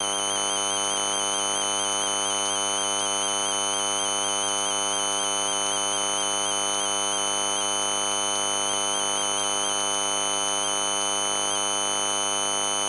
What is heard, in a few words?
circuits electric hum buzz beeps; sonniss gdc